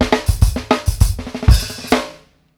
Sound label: OPEN HAT 3 R, Drumworks FILLS 96; Sampleheads; New York City Drumworks VOL-1